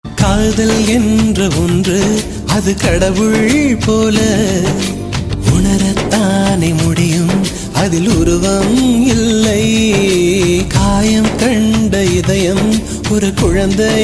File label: Tamil Nice Melody